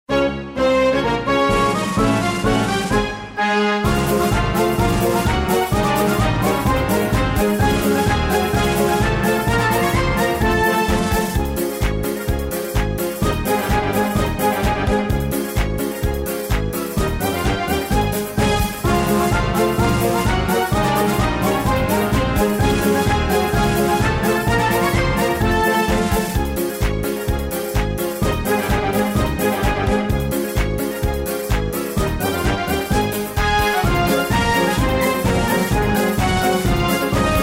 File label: music